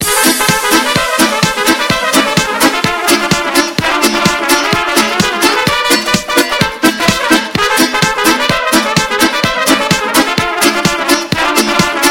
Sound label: balkanic ringtone